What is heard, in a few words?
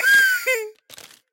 win trumpet; Hot Party Deluxe; Wazdan